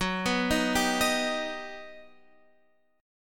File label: G Augmented Major 7th